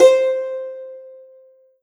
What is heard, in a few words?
Roland SC-88 Dulcimer C5